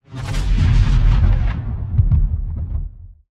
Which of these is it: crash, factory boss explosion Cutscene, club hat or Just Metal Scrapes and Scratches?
factory boss explosion Cutscene